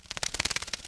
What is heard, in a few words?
atk bat